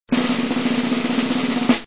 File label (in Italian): Rullo Tamburi; Tono di notifica; per Cellulari Android o Iphone